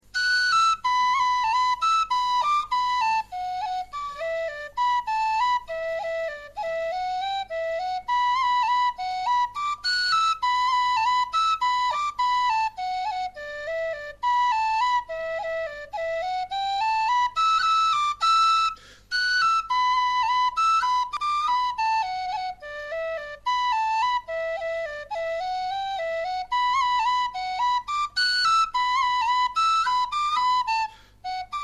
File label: flute